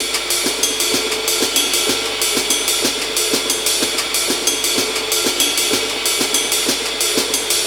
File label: 125 Classic Breaks, 125